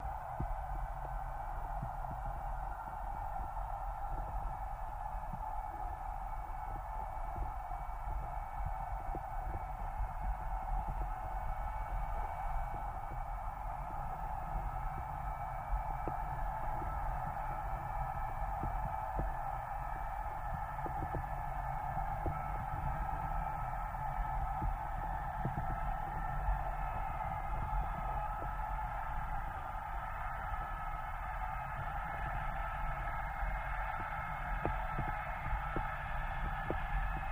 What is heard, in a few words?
Torpedo Jet By, torpedos